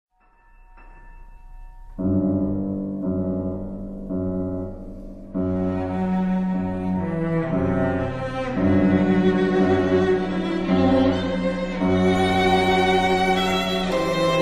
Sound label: quartet for 2 violins, cello & piano Larghetto, ma agit; Cowell; Scenario; agitato